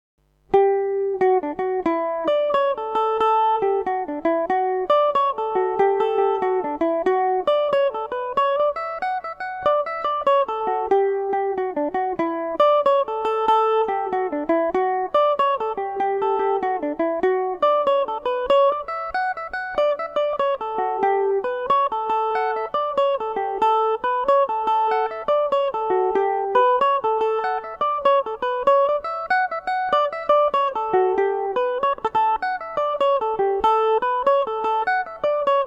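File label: D major revelation, irish mandolin; The Humours Of Ayle House; Revelation Jaguar electric mandolin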